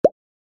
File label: reelspin click; Bubble Double